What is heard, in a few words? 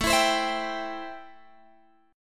A-Minor 7th A Guitar Open G